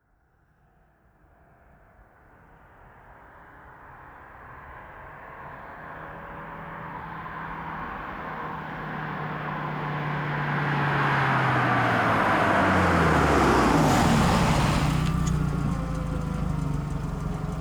Volkswagen Golf V 1.6 FSI exterior stop reverse and drive away; mono NTG3; Soundholder